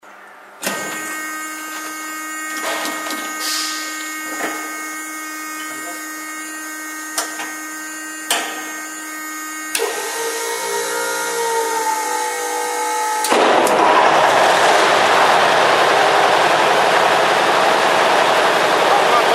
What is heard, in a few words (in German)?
Anfahren Fahrt